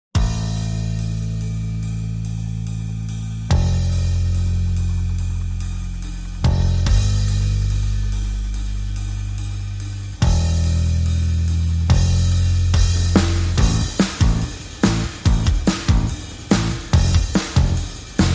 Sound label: Drums and Bass